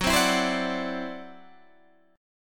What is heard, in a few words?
E 7th Suspended 2nd Sharp 5th Gb, guitar